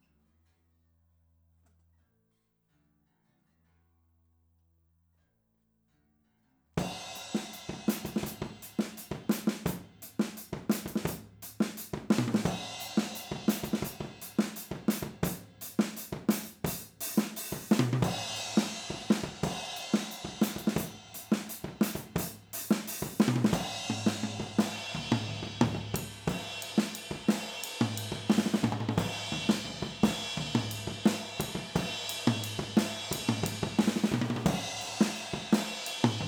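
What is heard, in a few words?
overhead Drums, stereo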